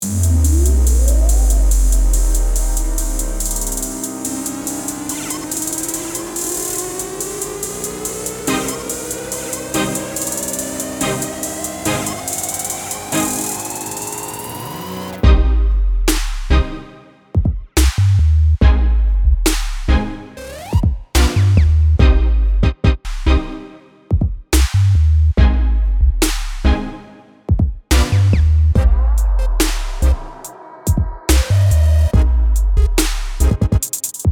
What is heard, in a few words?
electronic cue